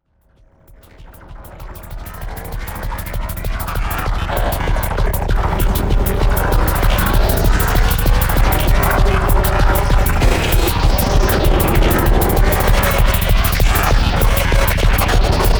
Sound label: cauldron; wizards